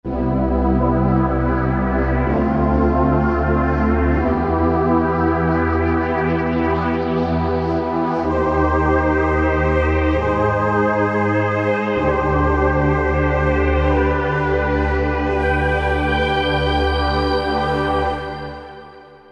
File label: pad, E-mu PK-6; Trilogy